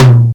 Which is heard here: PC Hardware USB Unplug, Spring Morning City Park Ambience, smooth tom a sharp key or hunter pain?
smooth tom a sharp key